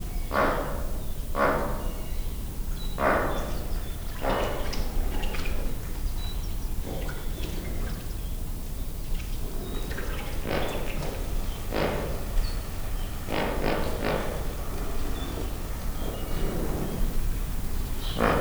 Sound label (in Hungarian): a hasmenéses marabu is, törpe flamingó; debreceni zoo 2012; marantz puska